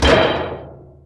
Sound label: Girder Place; Worms3D